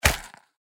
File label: weapon bow arrow land